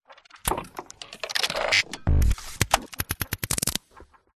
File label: science fiction steam punk machine operate clicks beep weird; Audio Mangler